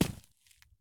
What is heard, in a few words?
soil sprint; Escape from Tarkov